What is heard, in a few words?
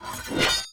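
cavalry mace, weapon; Battle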